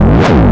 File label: pitched, zaps and beeps